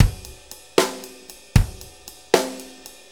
6-8 Loop, Full Kit Loops

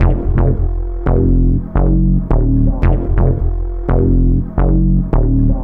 Metal Synth Bass; Sonic Foundry (Sony Creative Software); Knutrix Drum & Bass

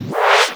Speedy, cartoon

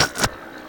Roland f30 drums

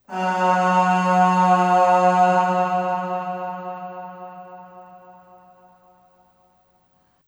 Aah Short F#2 Long Reverb; Harmonic Building Blocks

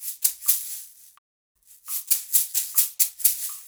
SHAK, Percussion Loops

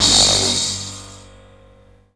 SPRING

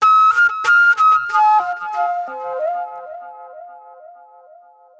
NY 70's Delay Flute

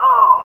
VOC 160 BPM; Ueberschall; Drum & Bass Flash Trackz

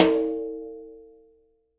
BRUSHES, Living Drums

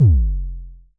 Mild Thump Kicks F1, Vermona Kick Lancet; LookIMadeAThing Kicks; M8; Mild Thump Kicks Samples

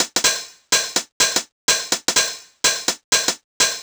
drum loop hurtme hat 125, Classic Chicago House; Sample Magic; Drum Loops

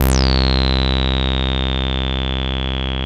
SAWREZO, BASSES; Masterbits - Vintage Voltage; VV BASSES

